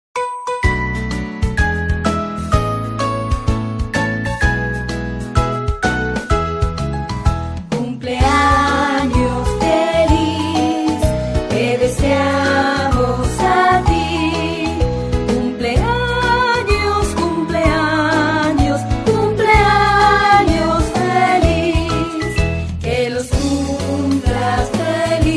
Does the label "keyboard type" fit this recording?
No